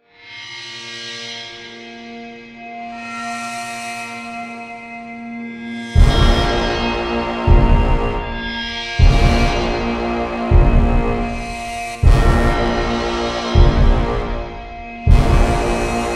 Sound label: Battle Intro; Infinity Blade 2